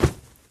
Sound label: Body Soft thud